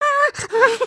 fear; emotes

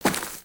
Footstep; Lego; Strombie; creatures organic

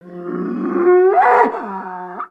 Pet Buffalo Beg; creatures pets; Lego

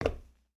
Foot Steel Boot Walk, footsteps

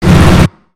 Robot Air Flail; creatures mechanical; Lego

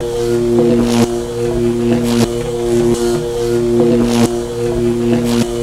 tesla coil; spaceship

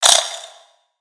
Spider Die; Lego; creatures mechanical

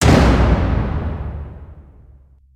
smash; build mode

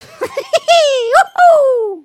happy, emotes

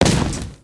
Troll Rock Crunch

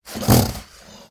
Pet Croc Alert; creatures pets; Lego

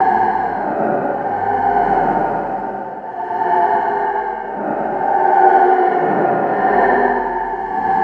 Tone; Blue Demon; destructibles

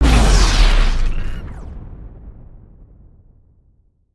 Tornado Endblast

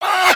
Crab Happy; creatures pets; Lego

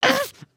Angry, emotes

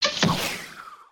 arrow release long; weapons; aronwk collection; ndaudio

